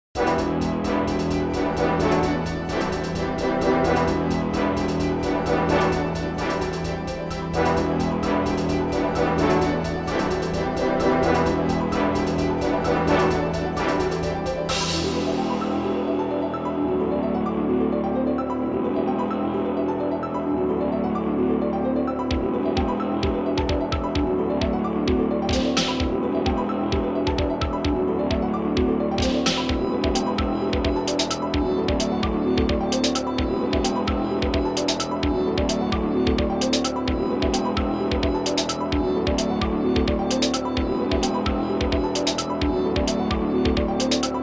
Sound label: Royalty Free Music